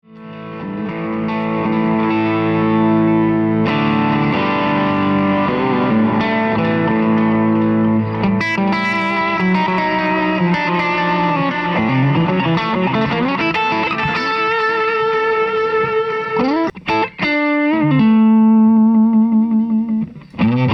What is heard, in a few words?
Opto Line6 ODR1